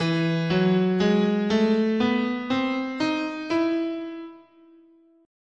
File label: E Harmonic Major, scale